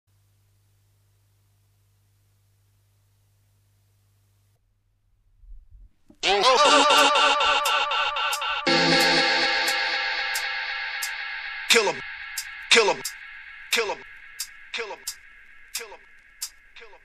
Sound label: Drum and Bass Jungle and Old Skool; LIVE; UK Bass Radio